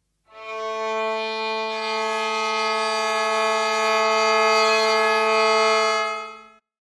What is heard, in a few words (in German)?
geige